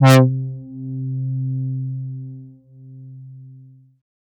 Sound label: Synths Note C